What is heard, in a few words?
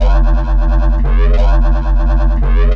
Bass loops; neuro; Optiv & BTK